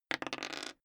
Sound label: die roll table long